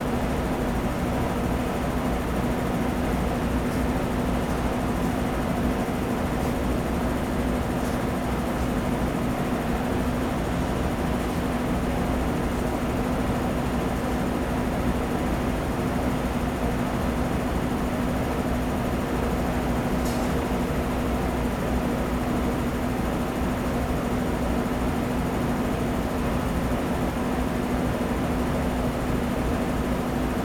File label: Noisy Fan